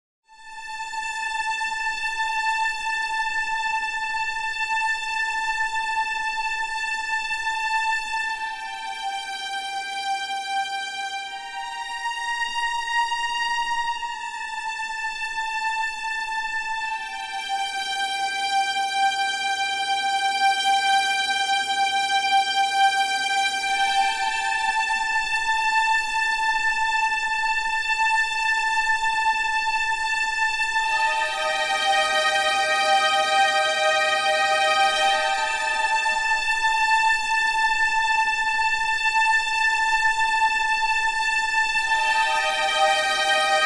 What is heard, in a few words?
Strings; starts at verse 4